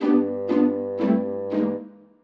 orch sting; musicradar; cartoon caper

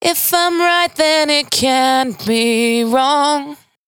92bpm Bb, Velvet Vocal; Zero-G

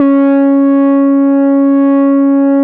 C#5, Bass; E-MU Producer Series Vol. 6 – Analogia Project; Boom da Bass

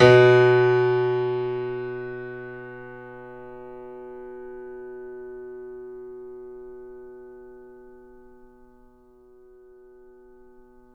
FF B 1, Grand Piano off mic; AKAI S6000 CD-ROM - Volume 2

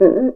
CUICA; ILIO

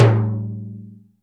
TIMBL1 L, PERCUSSION; Crypton - DrumBase5000; PERCUSSION#1

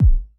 kick, Roland MC-909

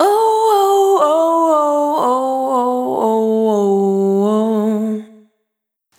Single Voice Melodic Loops; Singles

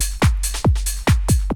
Bite Size Beats; FM 57 - The Ultimate Sample Collection (1997); Essential beats

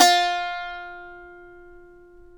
SAZ; Spectrasonics; Hans Zimmer Guitars VOL-2

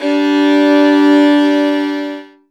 G2 fifth, fiddle; InVision Interactive; Lightware VOL-1; Instruments & Percussions

